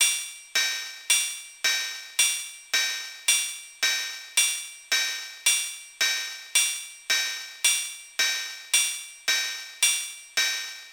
daiku

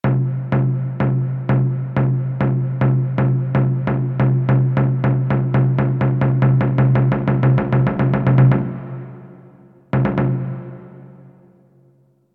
wadaikorenda

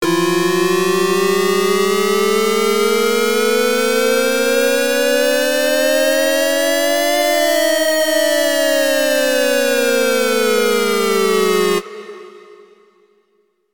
misairu

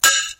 kahn

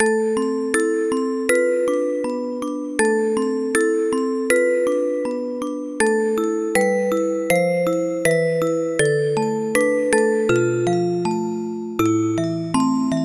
sakura sakura orgol

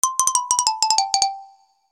korogaru

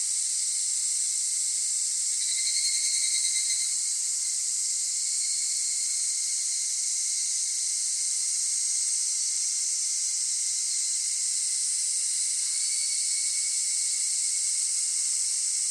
semihigurasi

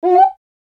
pafu

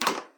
futa

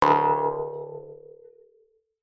hanekaeru

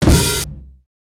dosin; 【効果音】ズッコケ音「ズシン」; ズッコケて尻もちをついたようなコミカルな効果音です。大きな人やキャラクターが盛大にずっこけた時などの音。データを消去した時の音などシステム音にも使えます。

